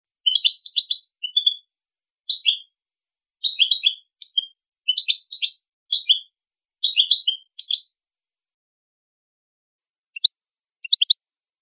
repertorio toques; campero

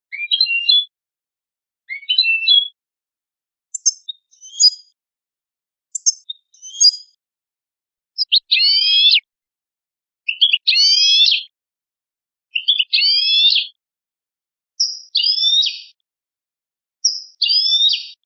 quejas compuestas

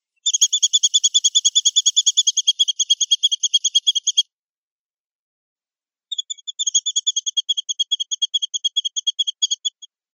tiriteos; pardillo

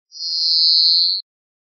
quejas giros; verderon

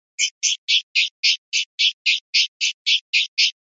cosqueos, pardillo

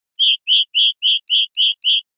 golpes bibibi, pardillo

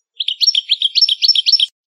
llamada de paillo; campero

